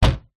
household window old wooden close queenslander australia; zapsplat